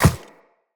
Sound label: Walk Ice, Footsteps; Operator